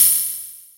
Hats Electro Open

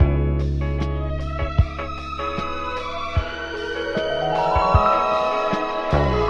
strings smooth guitar, Loops; Phonky

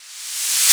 reverse fry, zaps and beeps

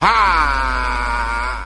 "ha", Voice

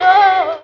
"oh" sung

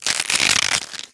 bone break wet

